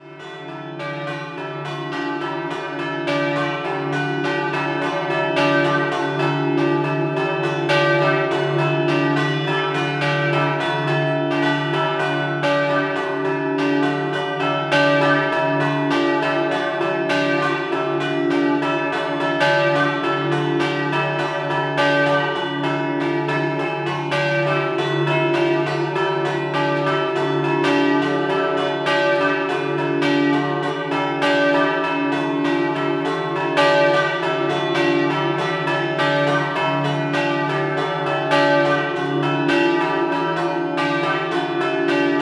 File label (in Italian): Mereto Scampanottata, campane